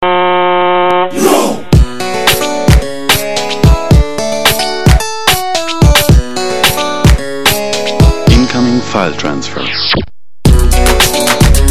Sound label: ICQ remix